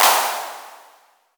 large room clap single shot c key; single hits one shots

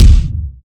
reverb kick drum sample d sharp key

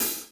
studio shaker sample b key; percussion

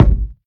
fluffy kick sound G sharp key

